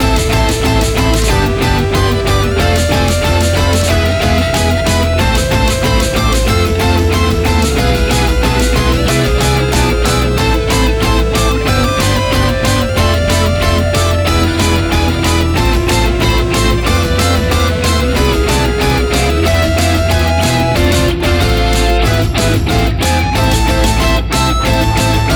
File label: Bossfight 185bpm; Mus Gameplay Level Bossfight2 D 185bpm